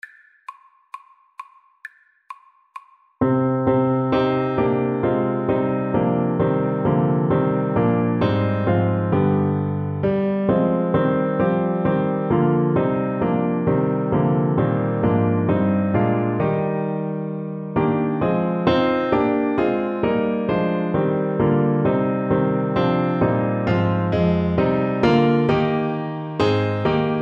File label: Tenor Saxophone